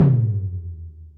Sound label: Bassdrum, Pearl SC-40